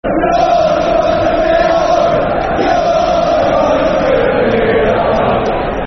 You're Supposed To Be At Home, Football Chants (Soccer Song); Chelsea Cfc